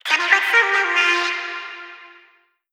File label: Vocal Sample Pack Phrases Eb; Slate Digital Omnivox Vocal Sample Pack